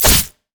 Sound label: hiddenblade; Zed